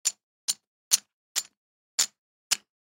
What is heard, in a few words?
Poker Chips Hit, Poker Chips Hit Together Pick Up Stack Short Felt Table; Casino